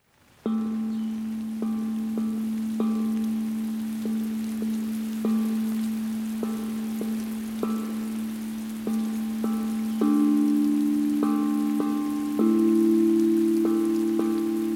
Listening to the Rain With You on a Pagoda Balcony Instrumental Music Steel Tongue Drum